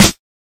subtle reverb snare one shot g sharp, Royality free snare tuned to the G# note; snare drum samples